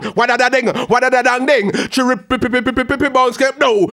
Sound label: RAGGA MUFF, B maj; Sound & Vision - Gigapack II